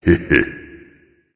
heh heh, voice; Smite; ymir skin9

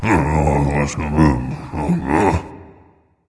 grumble; cupid

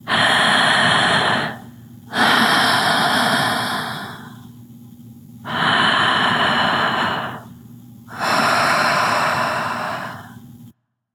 breath light; smite; voicepack; hel

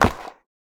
skateboard land hard; smite; mercury

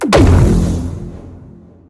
atlas pulse; smite; god atlas

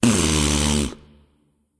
tongue; smite; zeus

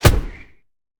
hit arcane; smite; merlin

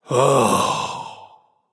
nv sigh; smite; voicepack; xbalanque

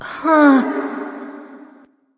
confused light; smite; voicepack; hel

